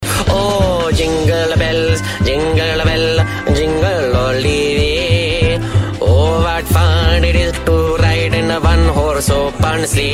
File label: Jingle Bells Indian Rington